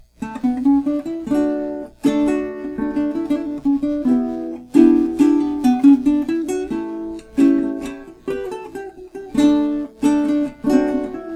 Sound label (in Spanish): solo de 4